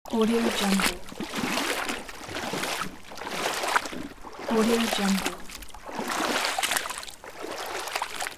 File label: water steps loop